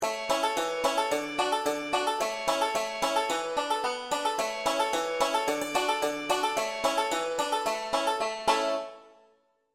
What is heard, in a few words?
acc with melody key of a, banjo; 5 string; boil cabbage